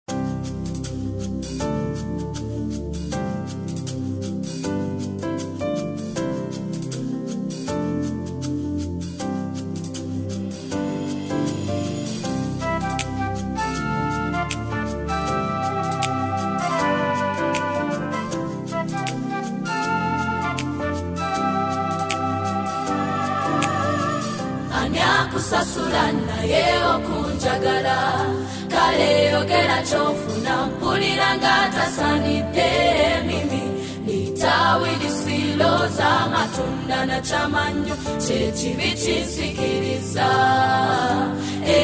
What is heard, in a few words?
choir; ebenezer